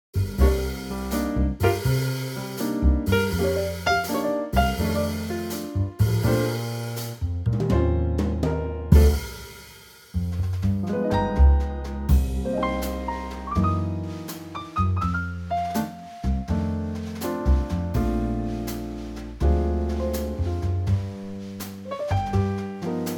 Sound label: Bb Trio